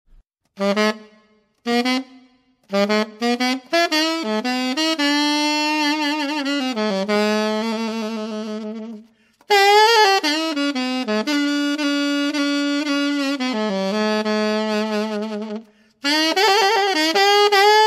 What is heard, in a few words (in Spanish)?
saxofon; Sonido del instrumento; Alexander Cruz